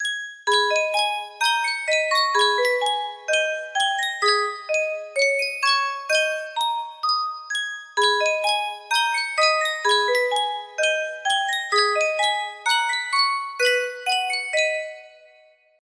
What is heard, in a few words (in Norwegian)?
yunsheng musikkboks